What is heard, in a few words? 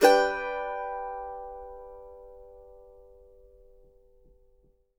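MANDO MAJ ST, mandolin G major; Sampleheads; Whole Lotta Country 1 & 2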